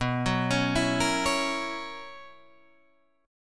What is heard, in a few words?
B Major 7th Suspended 2nd Suspended 4th B down Guitar Drop D